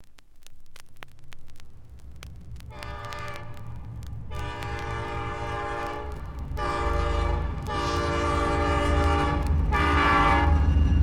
Diesel Engine

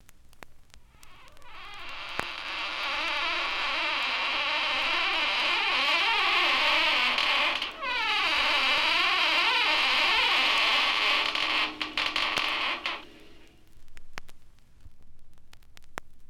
Creaking Door

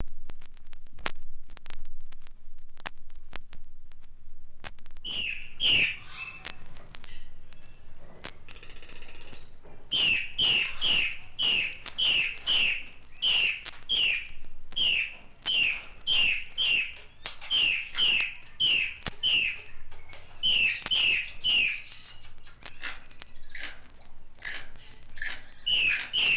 Tropical Birds

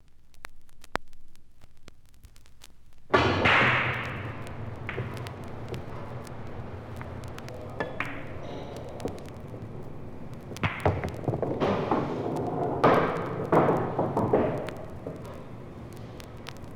Billiards (Pool Table)

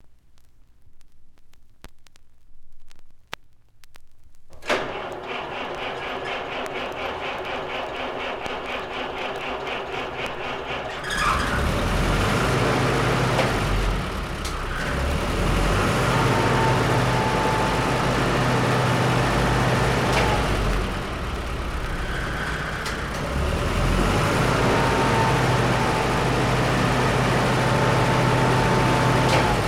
Gas Engine Starting

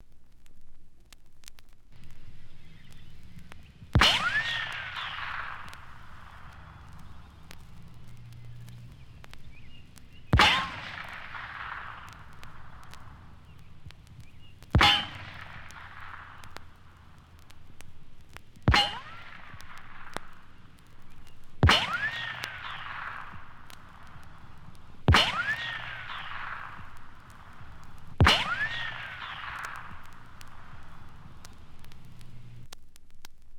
Pistol Shots And Ricochets

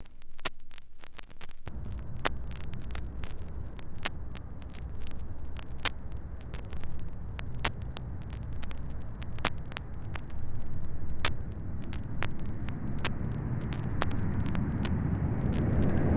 Jet Taking Off

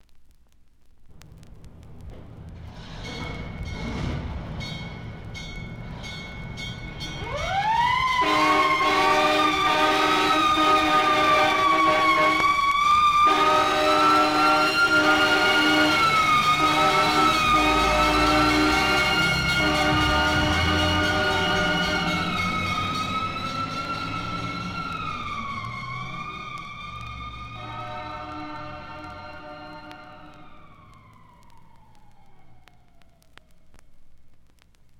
Fire Engine

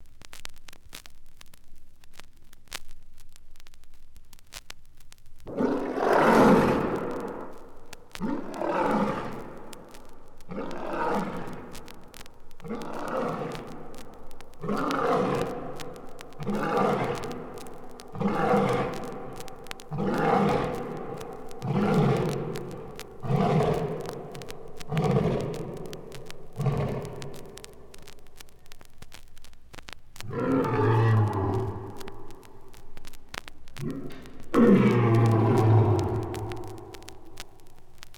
Lions Roaring

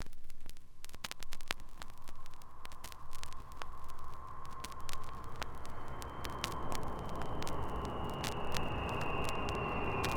Viscount Landing

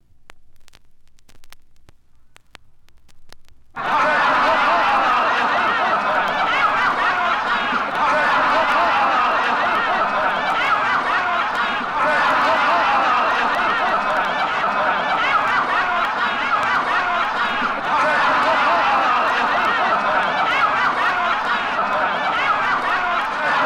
Large Crowd Laughter

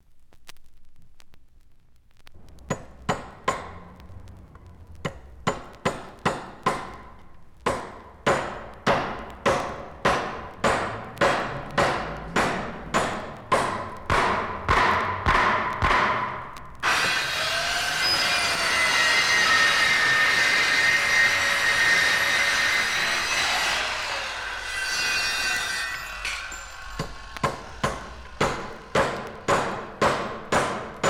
Hammering Nail (Right) Electric Saw (Left)

